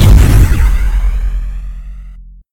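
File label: asmd explosion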